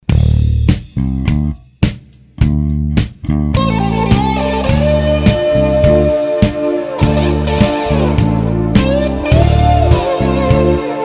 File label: Guitar Solo